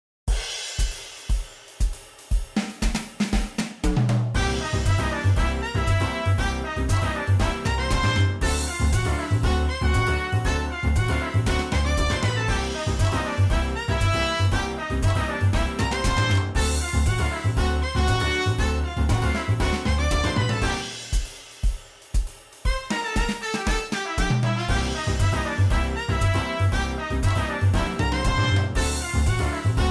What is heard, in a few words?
Casino Carnival